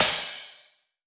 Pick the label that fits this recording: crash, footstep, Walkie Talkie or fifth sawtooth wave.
crash